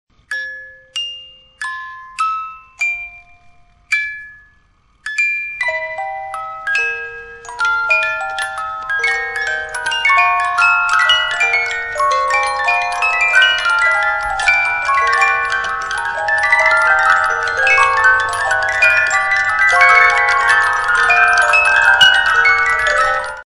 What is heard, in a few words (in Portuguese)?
caixa de musica